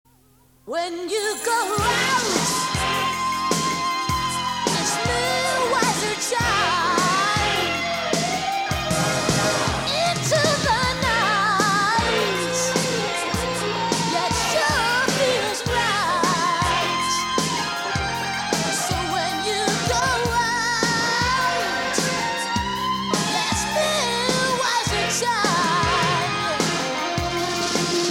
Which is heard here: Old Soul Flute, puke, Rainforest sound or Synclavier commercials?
Synclavier commercials